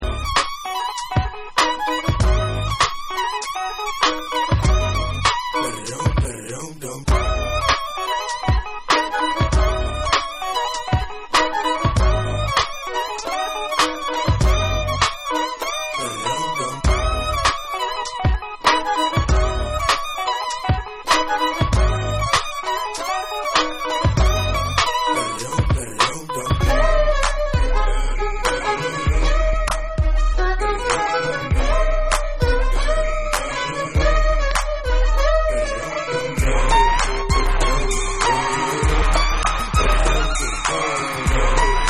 Instrumental

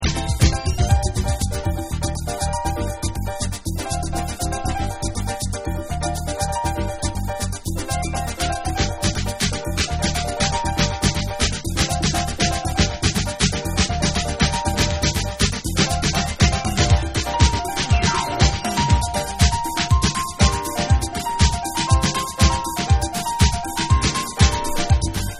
Piano Dub

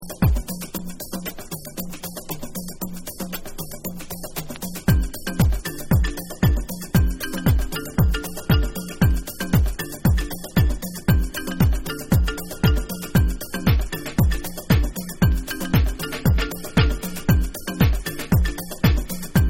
Bonus Beats

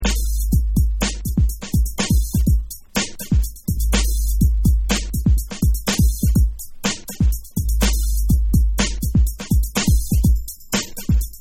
DRUMS Drums

